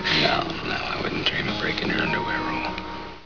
scream

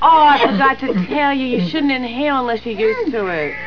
inhale

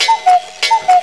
clock cuckoo

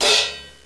cymbal

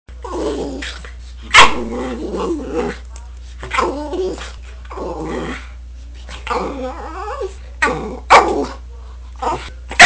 dog growl

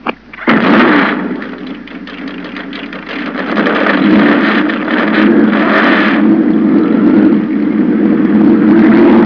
car start

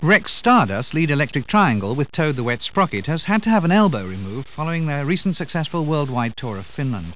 rock note

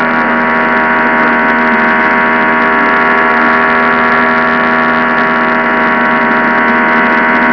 car running

